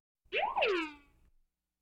kosmische quaken, Science Fiction

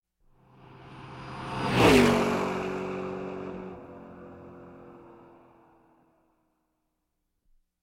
rennwagen mit 195 kmh von rechts nach links; Sport

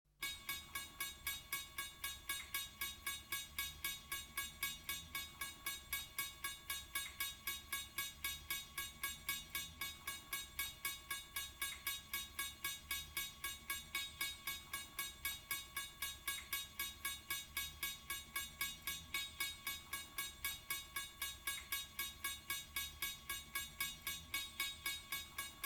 schließende bahnschranken; Bahnhof

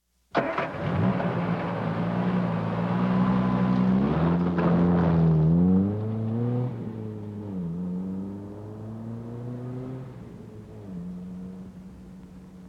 amerikanischer sportwagen; Auto-Zweiraeder

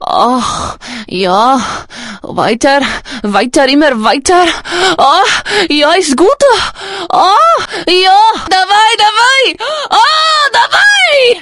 orgasmus russisch

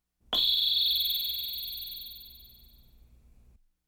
Laserkanone

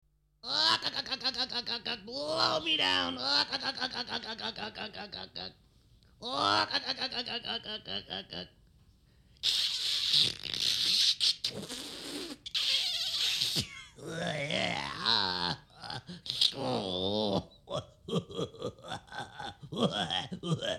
halt mich fest lachen

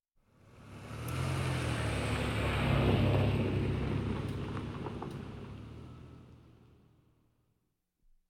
langsam vorbeifahrendes auto; Auto-Zweiraeder

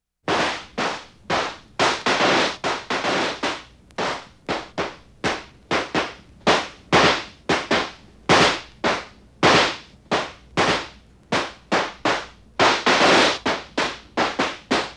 silvesterkracher; Feste-Feiern

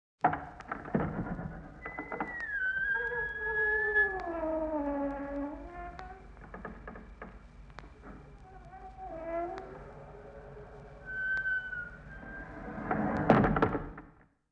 quietschende tür öffnen und schließen; Horrorgeraeusche

